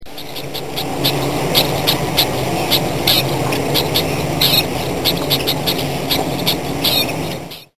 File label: squirrel